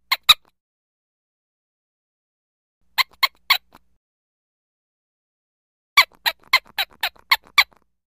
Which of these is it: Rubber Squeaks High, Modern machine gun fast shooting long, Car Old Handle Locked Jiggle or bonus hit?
Rubber Squeaks High